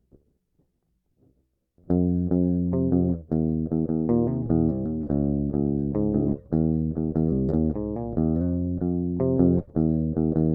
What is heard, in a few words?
Lead